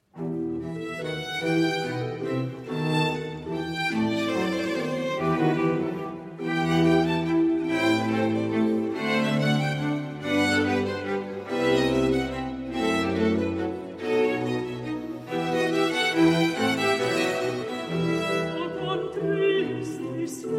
Stabat Mater, sequenza per contralto, archi e basso continuo in F Minor; RV 621; Vivaldi